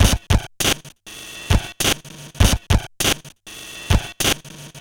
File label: Bent RX17 (100BPM), Circuit Bent; glitch